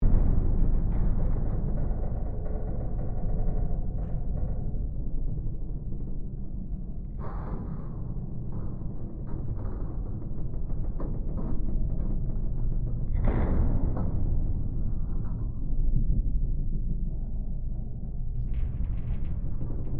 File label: Created Ambience Ship Hull Engine Creaks Impacts Loop, Created environment inside a ships hull; Created environment inside a ships hull (Spaceship or Seaship); Artificial Sound Effects